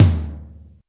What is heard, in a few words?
Roland U110 Rock Drums G5